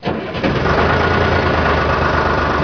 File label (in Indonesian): suara mobil